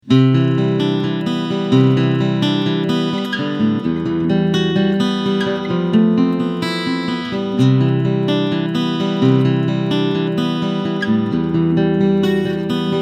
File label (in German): PRS SE P50E BG Picking Mikrofonsignal